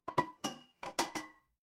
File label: glass ceramic